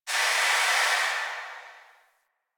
Landscape Construction Site One Off, Ambience

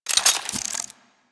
Laser Aim Rifle Reload, Weapons; Grineer

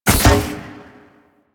Heavy Grenade Launcher Thunk; Warframe; Archwing

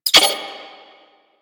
Bomb Carry; Missions; Raid; Grn Bomb Objective

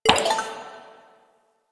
Messenger Window Open; Ambience; Warframe; Nineteen Ninety Nine

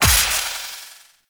Serpent Ability Tendril Crack; Warframe; Warframes; Alchemist

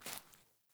Grunt Boot Walk Veg; Characters; Enemy; Melee Grunt; Footsteps; Boots; Sinecure

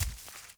Walk Veg, Footsteps; Characters; Enemy; Mockeries

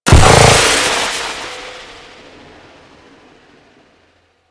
Force Field Hit B; Warframe; Ambience; Tenno

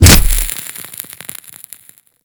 Generic Ability Impact; Warframe; Ember

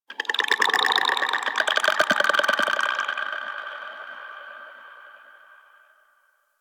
Infested Devourer Vocal F; Warframe

